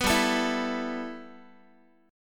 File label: G 6th Add 9th G Guitar, chord; G6add9 strummed; 14,15,12,14,x,x